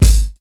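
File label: KICK, DRUM KITS; InVision Interactive; 40 Oz Phat Slammin Hip Hop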